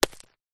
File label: impact rock small hit solid ground